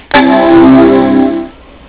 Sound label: Macintosh Centris 650